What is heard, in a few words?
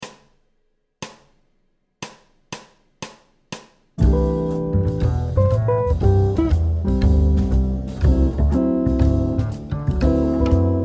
backtrack 120bpm